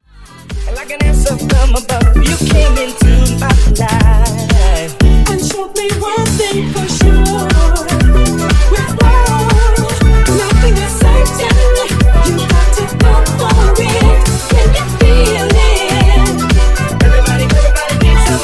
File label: Funk Nu Disco House 120Bpm